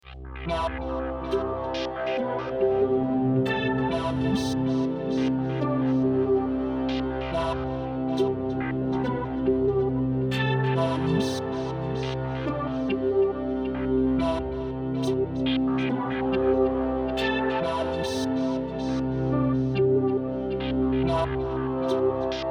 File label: C140 Doepfer sequence